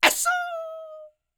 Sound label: YELP, World Voices, ANDES FX